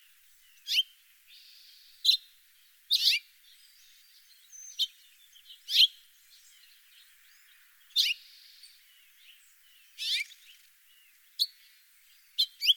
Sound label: carpodacus mexicanus, los altos hills